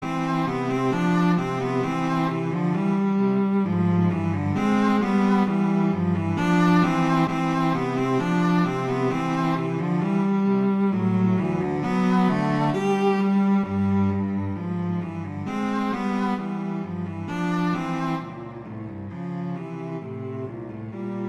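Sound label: Etude, cello; Popper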